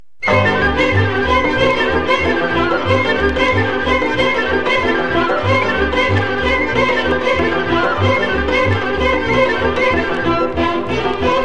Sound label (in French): Roumanie